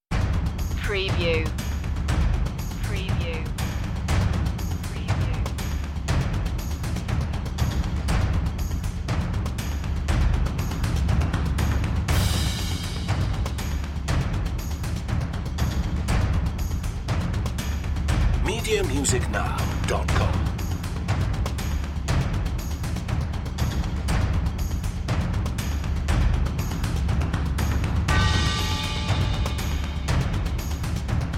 perc mix

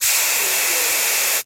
Spray Paint Can Single

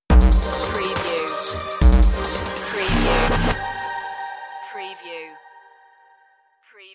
ice ragga stinger

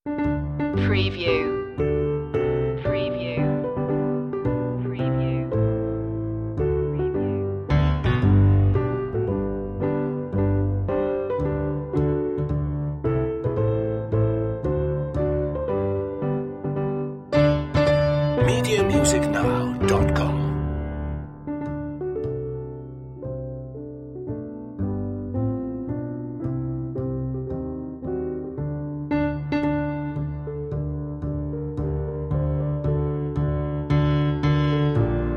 la marseillaise french national anthem piano

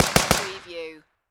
Submachine Gun HK MP5; Sound Effects Bible

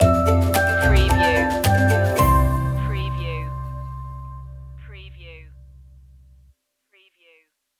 take my hand stinger

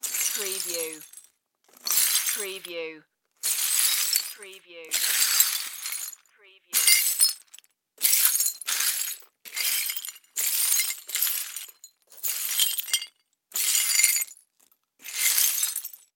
Debris Glass Sweep

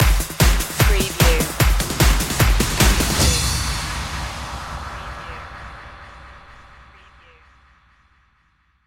sparks of light stinger

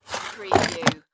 Makeup Kit Close

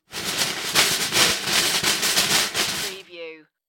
Cartoon Drum Loose Snare

